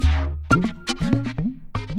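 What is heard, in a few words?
Music Base 120; from dust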